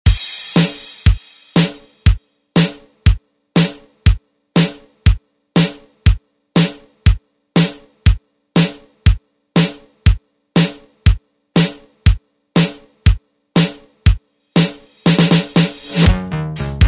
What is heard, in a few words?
Oldschool Redrum; Deejay Hit